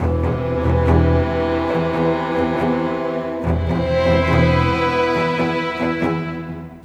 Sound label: Cinematic, Orchestral Series; Sonic Foundry (Sony Creative Software); Orchestral Series VOL-3; Cinematic 19